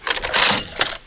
clip out; zombie plague

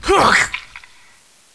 Pain Female

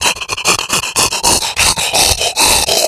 Gorefast infected; zombie plague

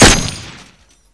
gun

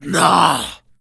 zombie attack, claw attack; zombie plague

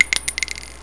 shell, player

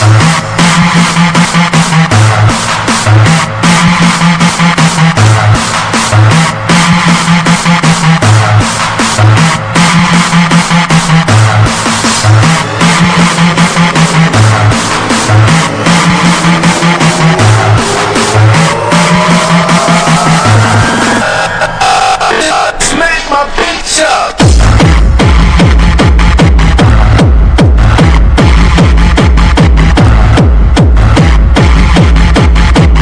hardstyle

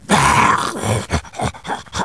pain

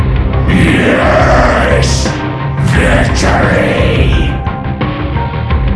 zm win

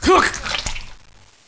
zm pain; cstrike

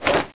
claw miss; oblivion darkangels

